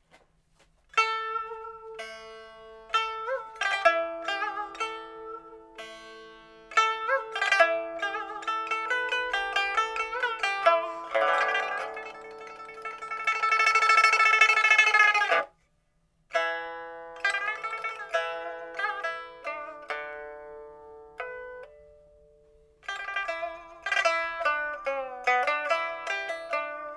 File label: Pipa; SilkRoad